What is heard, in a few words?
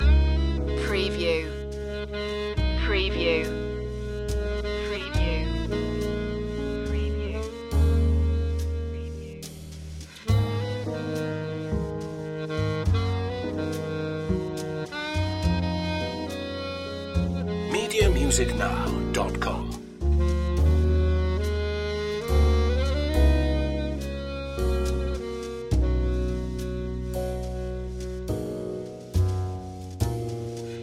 morning ballad loop, Moody, soft, warm